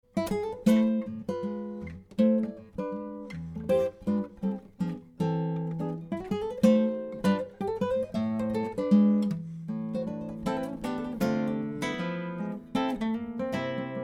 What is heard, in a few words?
Jazzy Cat, Guitar